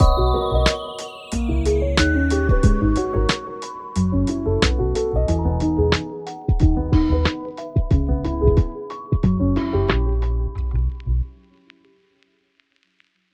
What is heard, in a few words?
Lofi Music End Stinger; Kawaii Love Lofi Music Pack 2